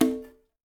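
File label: brush conga; Spectrasonics; Liquid Grooves 1 & 2; CONGAS+BGOS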